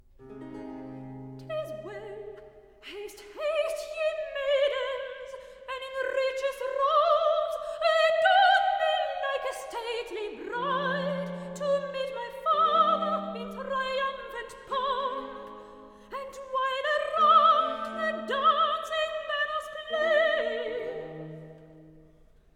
Recitative; (Iphis) Tis well, haste, haste, ye maidens; Handel Masterworks; Klassika; Act 2

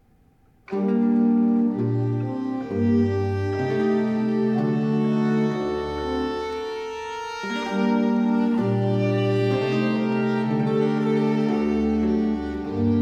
Sonate 8 in C major - 3. Largo; Arcangelo Corelli; Corelli - Complete Works; Klassika

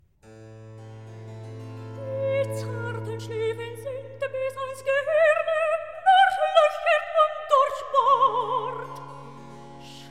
Recitativo; Tochter Zion Der zarten Schläfen; Klassika; Handel Masterworks

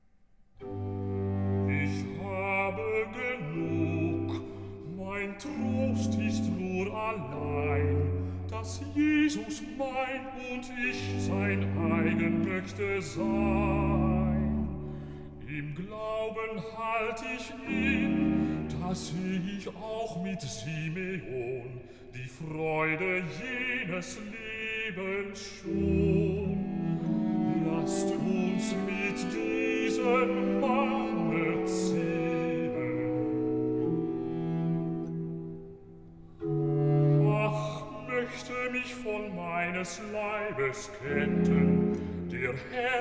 Recitativo; BWV 82; Ich habe genug; Klassika; Bach, Johann Sebastian; Cantatas; BWV 082 Ich habe genug

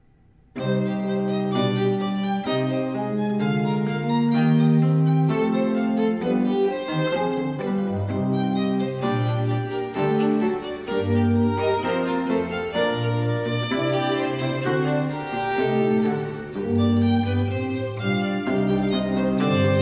Sonate 5 in D minor - 2. Allegro; Arcangelo Corelli; Corelli - Complete Works; Klassika

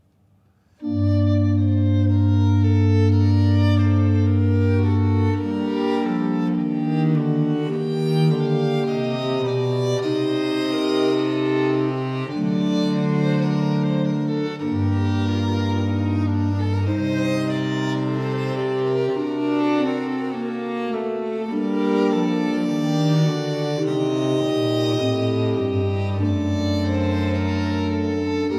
Sonate 4 in A minor - 2. Adagio; Archangelo Corelli; Corelli - Complete Works

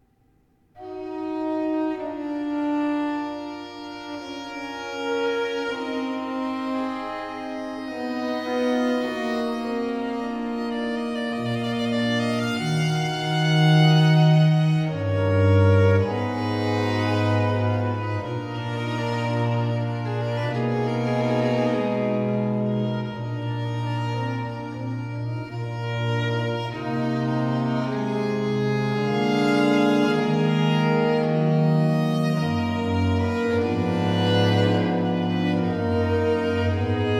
Sonate 9 in F minor - 1. Grave; Arcangelo Corelli; Corelli - Complete Works; Klassika

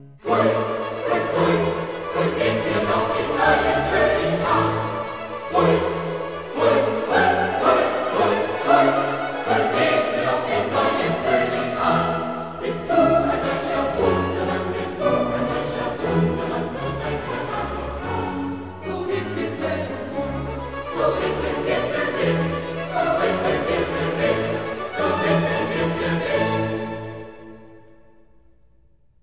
Coro; Pfui, pfui, pfui!; Klassika; Handel Masterworks

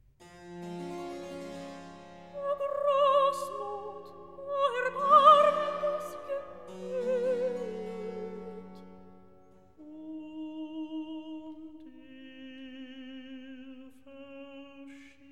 Recitativo; Tochter Zion, evangelist O Gorssmut!; Klassika; Handel Masterworks

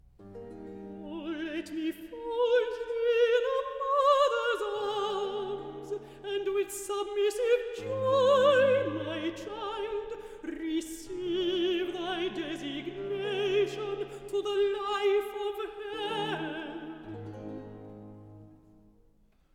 Recitative; (Storge) O let me fold thee; Handel Masterworks; Klassika

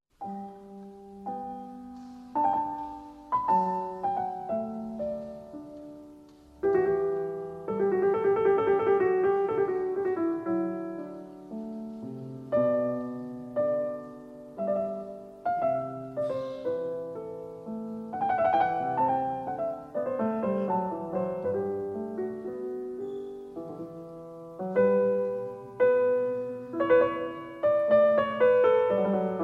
Bach Goldberg Variations

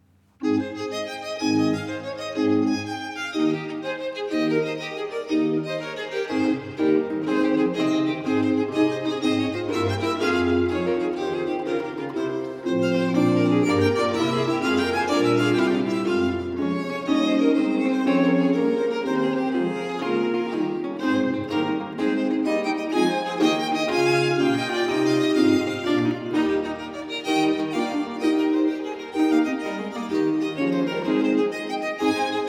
Sonate 5 in B flat major - 4. Allegro; Archangelo Corelli; Arcangelo Corelli; Corelli - Complete Works; Klassika

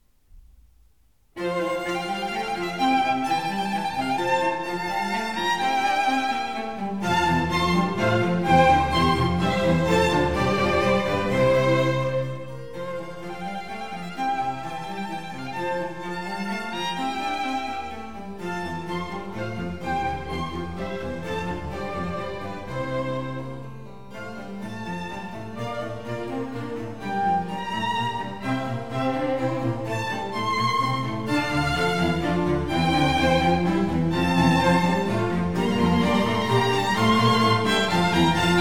Sarabanda. Vivace, Conciertos Grossos; Concerto No.12 in F

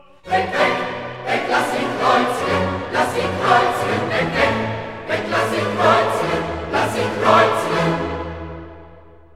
Coro; Weg, weg, weg!; Klassika; Handel Masterworks

